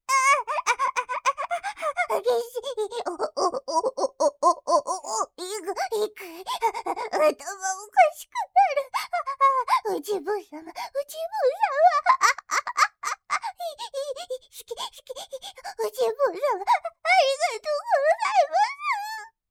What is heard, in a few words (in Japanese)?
あぁん♥あっ♥あっ♥あっ♥